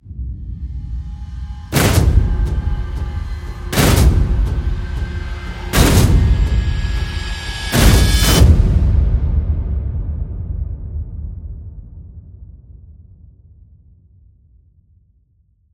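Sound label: sounds for trailer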